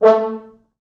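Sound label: 4 French Horns, Horns; A2; East West; Quantum Leap Horns Sax; 4 FrHorns MOD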